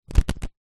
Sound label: game cards short shuffle; uno